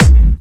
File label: Clubby Kicks, Bassdrums